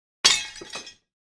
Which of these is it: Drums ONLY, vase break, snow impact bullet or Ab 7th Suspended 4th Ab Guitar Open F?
vase break